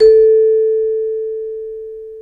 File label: Celeste A3; Digital Sound Factory Virtuoso